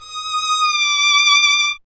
STR D#6, Solo Instruments Vln; Miroslav Vitous

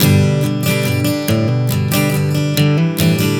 Strum 070 G, One Chord Strumming 070 bpm; Sonic Foundry (Sony Creative Software); Songwriter Acoustic Guitar Companion

